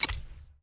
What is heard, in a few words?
UI Click Quit; bloom minigame